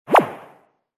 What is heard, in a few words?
Samsung Galaxy S 6 Official Notification